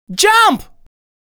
Jump, Vocal Phrases; Ultimate Vocals

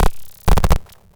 Cwejman S1; Brittle Perks; DevSnd Cwejman S1 - JK

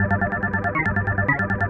Lead

